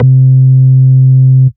Ultimate Bass Root C; Vengeance Samples

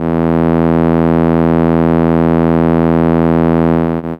Cello E2, ARP Solina; Unmodulated; musicradar-synth-samples

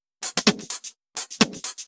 Electro Loop 128BPM; Vengeance Samples

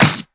Pre-Shifted Claps; Vengeance Samples

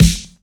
Snare; Vengeance Samples

